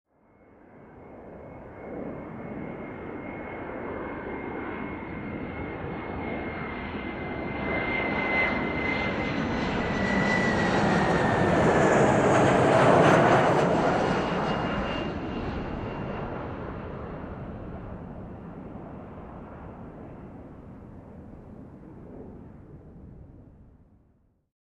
Airliner Departing

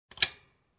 Clock tick

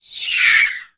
Pterodactyl hatchling full screech

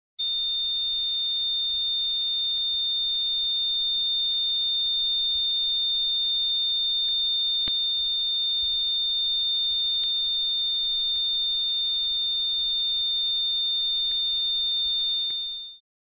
Simple Warning Signal

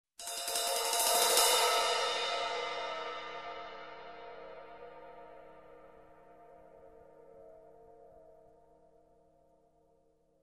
Cymbal Swell

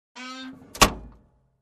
Squeaking compartment door close

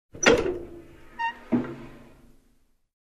Opening sliding door compartment

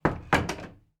Door close

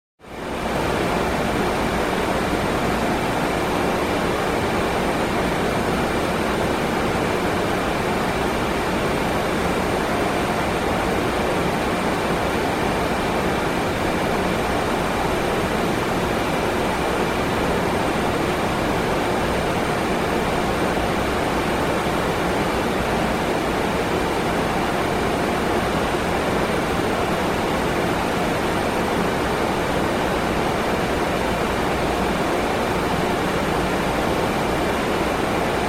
Air conditioning highest level

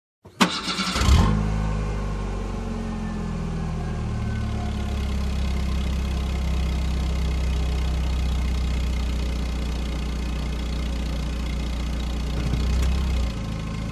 Car engine ignition and drive away

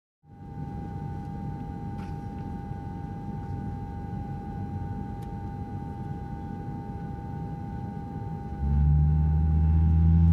Aircraft Cabin Ambience Ground Rolling

